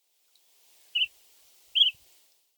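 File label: Greater Sand Plover; ishigaki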